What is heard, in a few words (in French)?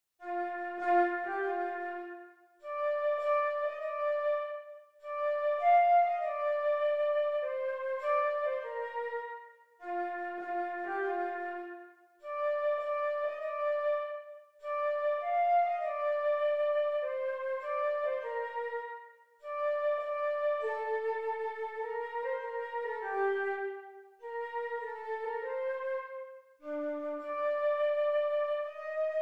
hymne national italien; mameli goffredo